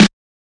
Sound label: Snares